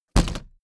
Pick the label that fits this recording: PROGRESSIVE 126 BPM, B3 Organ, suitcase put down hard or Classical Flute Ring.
suitcase put down hard